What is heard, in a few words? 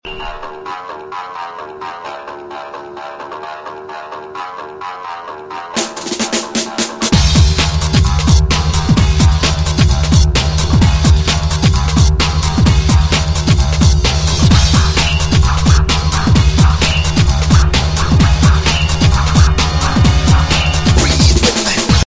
breathe instrumental ringtone